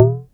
SYNCUSSION

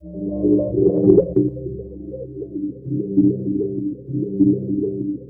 ambiant, special FX twisted; USB Soundscan; AKAI

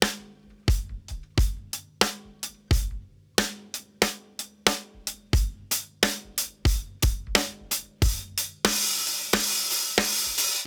Loose Beat, drums loops; BASEMENT DRUMS VOL.1

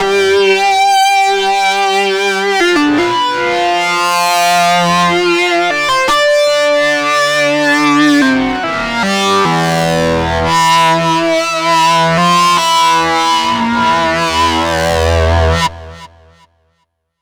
Feedback Lead; Dr. Fink's Funk Factory

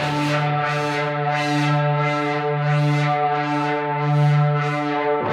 Hondo Fuzz FX 90 D, guitar drone

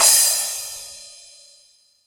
Roland R-8 Crash

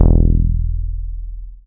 BAS MKS-50; Sound & Vision - Gigapack II CD 1

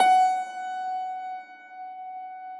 Thumb Tack Piano ff ped; Total Piano

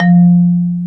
Bass Marimba

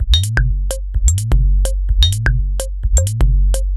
Pestule 127bpm, House Drumloops; 90 s Sample CDs; Zero-G House Fabrik Drumloops; ZG Drumloops

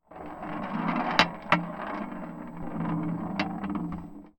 Tin Tray, Marbles, contact mic

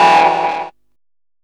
DR. LOOP, TRANCE; X-Static Goldmine 3

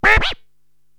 DJ Vocal Rub; Korg TR-Rack

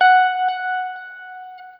NOODLE LONG, Guitar & Bass; Quantum Leap; 90's Sample CDs

